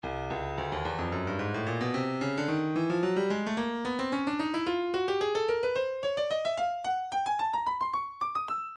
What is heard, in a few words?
breakbeat 110 bpm; booganu d; Stylus Breakbeat Midi Files